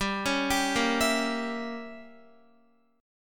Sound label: G Diminished 7th, Guitar; arpeggiated; 4F,4A,5C,5G,5C,6E; Gdim7